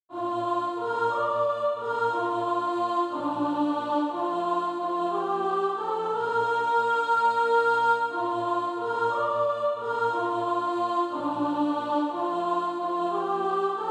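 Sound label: soprano, Practise Music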